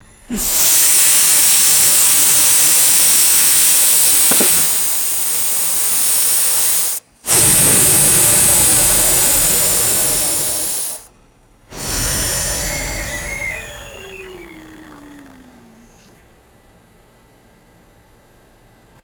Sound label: high pressure steam radiator burst; air